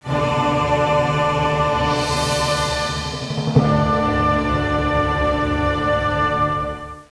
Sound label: Wizard Victory; Lego Battles 2009 NDS